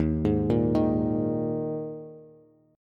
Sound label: Eb Suspended 4th, Bass; Ebsus4 arpeggiated; 11,11,8,8; JGuitar